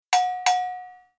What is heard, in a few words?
interpretive drums